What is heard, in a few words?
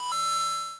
coin, zaps and beeps